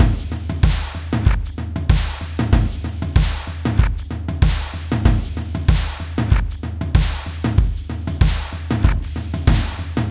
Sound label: 95 bpm